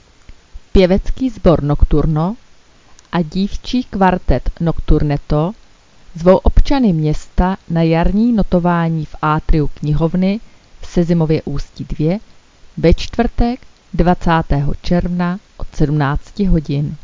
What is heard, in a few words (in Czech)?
koncert; Městský rozhlas; koncert Nokturna